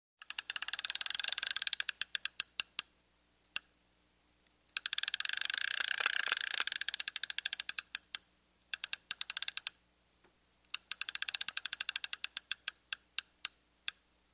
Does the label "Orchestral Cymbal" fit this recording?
No